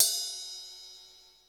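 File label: processed cymbal ride, drums; Crypton - DrumBase5000; PROC.DRUMS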